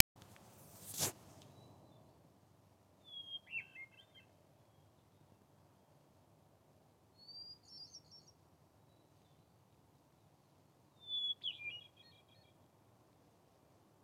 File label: hermit thrush